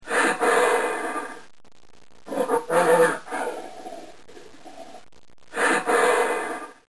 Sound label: gorilla